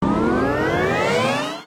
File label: intro ramp